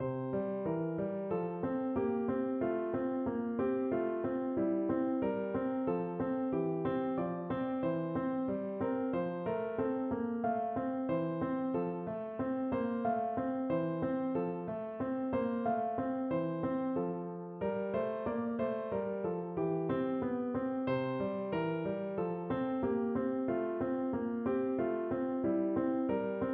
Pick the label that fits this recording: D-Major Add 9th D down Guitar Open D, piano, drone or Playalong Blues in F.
piano